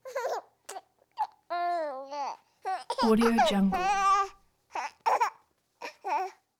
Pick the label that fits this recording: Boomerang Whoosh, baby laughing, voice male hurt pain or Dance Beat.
baby laughing